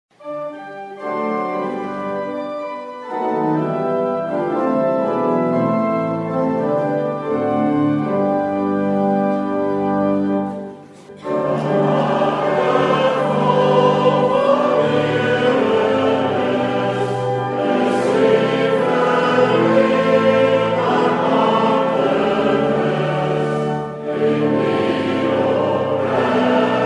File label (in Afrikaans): Gemeentesang